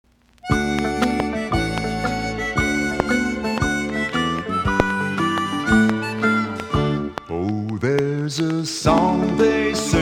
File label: Western; Snap Crackle and Pop